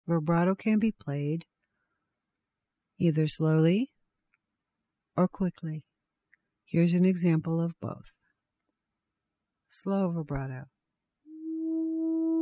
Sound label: Slow Quick, Native American Flute